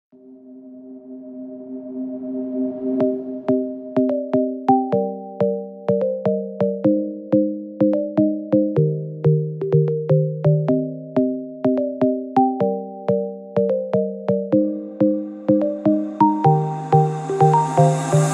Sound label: Chill Ringtone